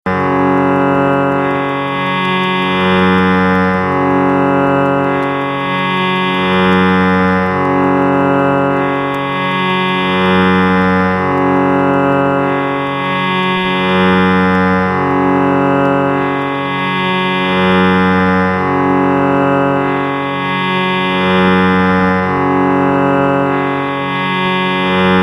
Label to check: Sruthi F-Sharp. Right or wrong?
Right